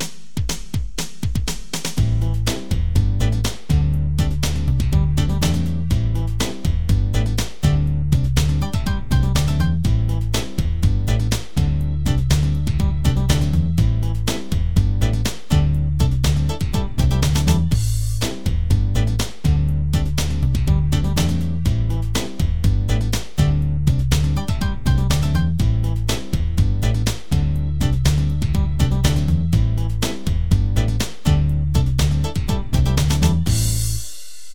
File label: Dance